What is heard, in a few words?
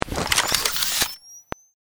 skill squirrel